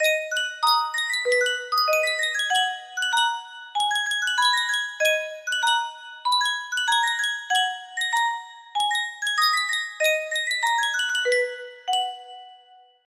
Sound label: Yunsheng custom tune music box